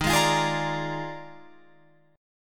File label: Eb Major 7th Suspended 2nd Suspended 4th Eb Guitar Open F; EbM7sus2sus4; strummed